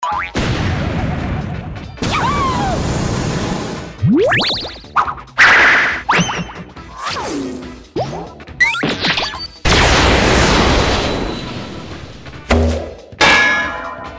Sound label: Cartoon; Cartoon Game Sound 2.0; JBoB Sound Pack Demo